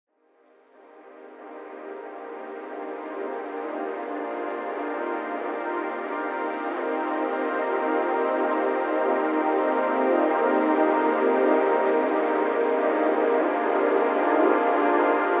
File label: Wavy Stars Pads